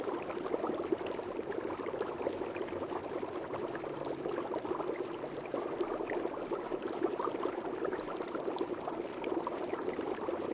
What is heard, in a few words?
flame loop; Smite; Sol